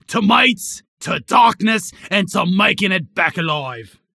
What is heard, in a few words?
Bar Cheering when drinking